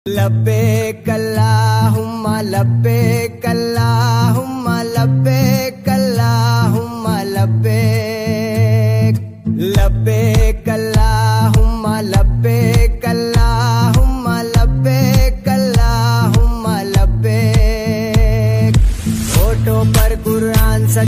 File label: islamic ringtone arabic ringtone turkish ringtone; labbaik allahumma